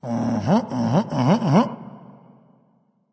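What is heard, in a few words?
nonverbal agree; smite; voicepack; ullr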